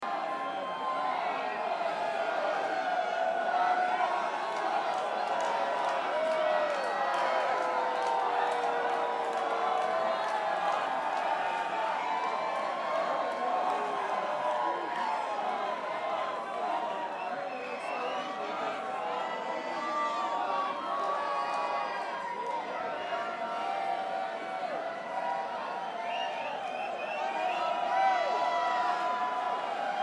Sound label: Crowd